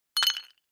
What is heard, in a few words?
Bar Drink Ice Cubes Dropped Into Empty Glass Clunking Rattle